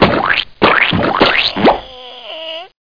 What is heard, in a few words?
Squish; Gaksters